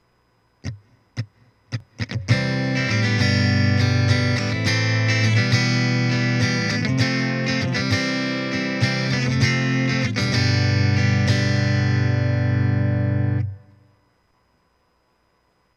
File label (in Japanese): アコースティックシミュレーター